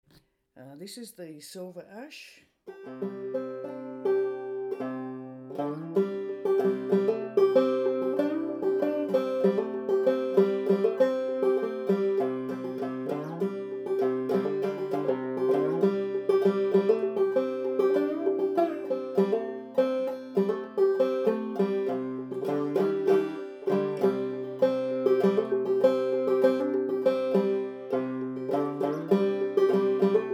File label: fretless silverash, banjo; reilly